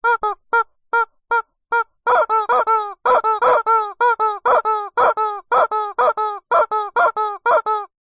leisure toy squeaky rubber chicken squeeze cluck multiple